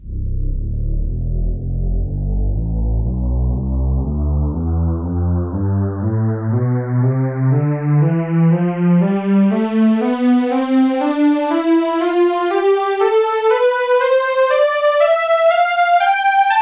halo pad tonleiter

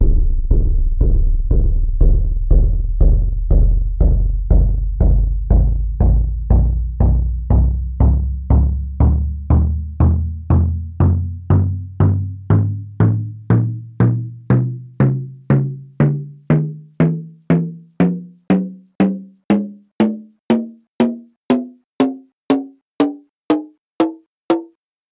taiko drum; tonleiter

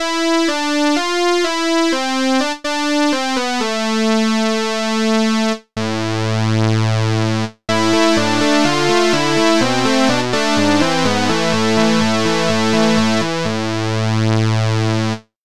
saw wave

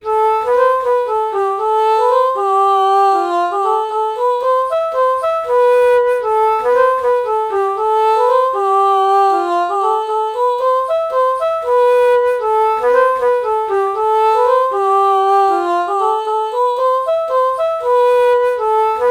alto flute ah melody morph

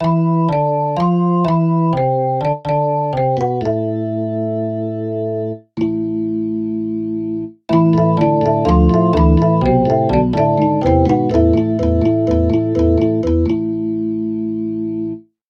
percussive organ

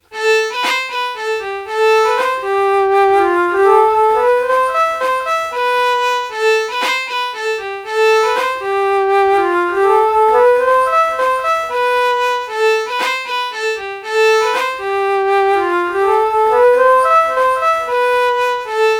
alto flute violin melody morph

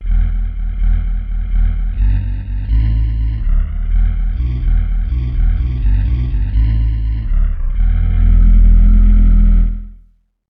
ohh voices bass

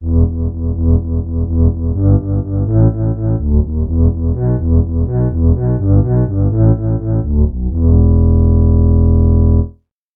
reed organ; bass

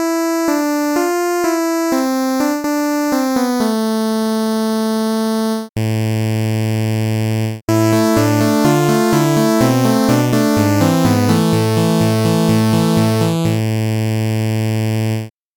fifth sawtooth wave

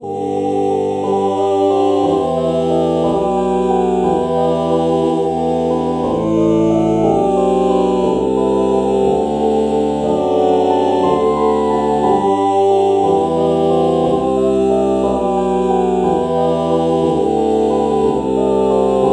oh oh morph

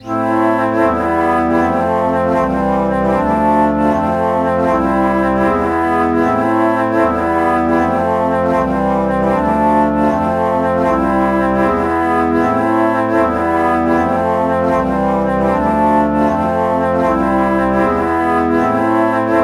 alto flute alto flute chord morph

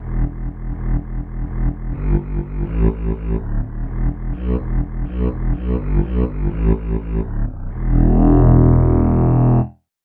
bandoneon bass

